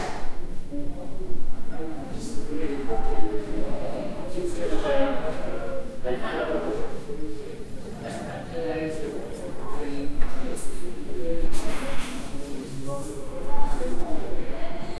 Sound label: background acoustic sound